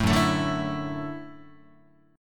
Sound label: F sharp Minor 7th Sharp 5th, F sharp, Guitar; strummed; 4A,4E,5A,5D,5G#,6C#; 0,2,0,0,1,3